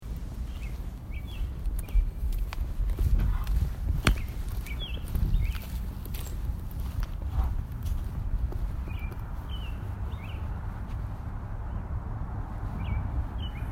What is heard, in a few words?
Walking To The Car